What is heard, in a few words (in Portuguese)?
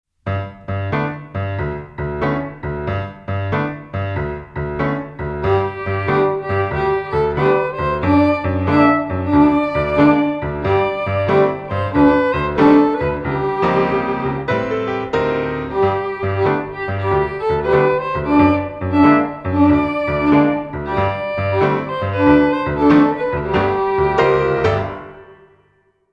O Solzinho (Instrumental), Violino; Violino para os Pequeninos